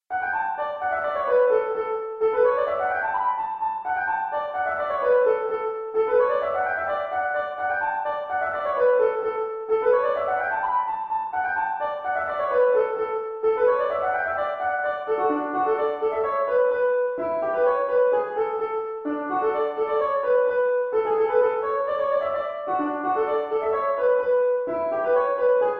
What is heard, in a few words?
USA Contra No Chord 120bpm